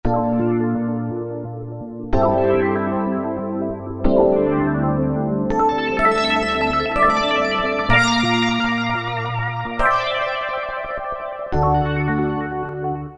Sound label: Korg T3 Wavesweep